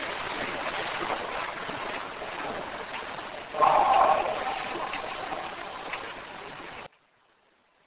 scary noise; Amplified; Almost sounds like a sigh as if from a ghost in distress. This clip is the original version and is not repeated; The Jerome Ghost Report

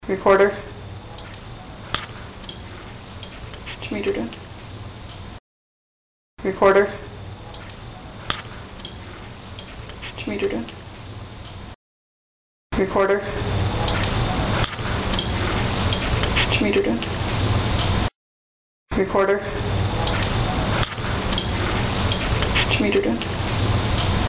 Weird Noise; Can you explain this? It is in the background and hard to hear; Original X1, NR X1, AMP + NR X2; Trails End Historic Site, also known as Kendrick Mansion; Wyoming

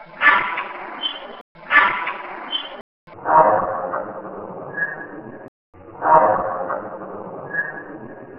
scrape slow; Slowed down version; sounds like a typical squeeky hospital cart to me; The Jerome Ghost Report

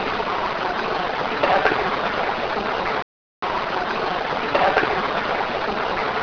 tapping; Douglas Mining House; Recorded near stables; this sounds like horse hooves; The Jerome Ghost Report

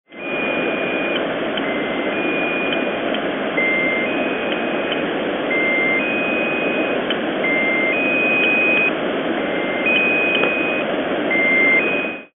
squeaky wheel; The Russian Squeaky Wheel - recorded off an SDR in Poland during the evening (local) and day (there); RADIO

